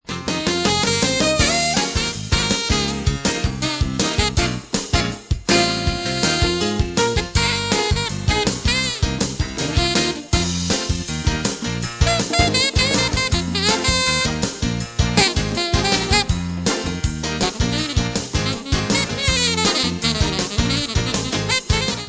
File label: samba, music only